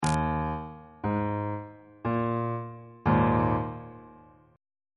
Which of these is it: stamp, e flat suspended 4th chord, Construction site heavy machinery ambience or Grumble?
e flat suspended 4th chord